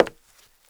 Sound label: HARDWOOD, FOOTSTEPS; 1000fx